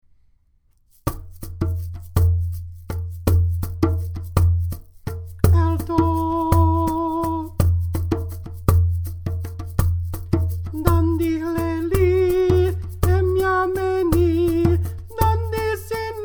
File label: alto